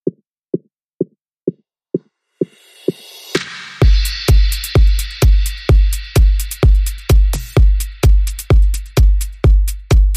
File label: Beat